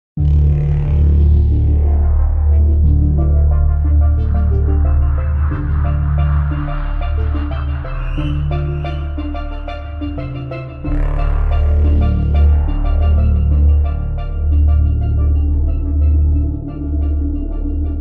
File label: Map Theme Music; Valorant